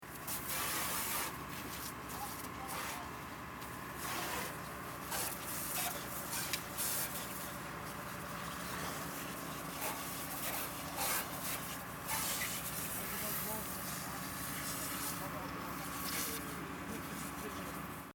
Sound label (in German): Silvester Auto wird geloescht